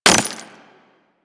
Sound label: Melee Impact Glass; Warframe; Weapons